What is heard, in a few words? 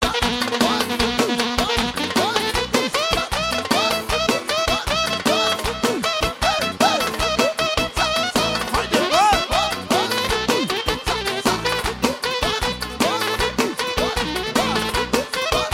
Clarinet